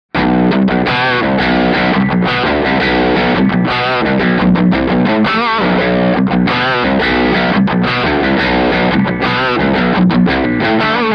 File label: Terror Crunch Dirty Channel Les Paul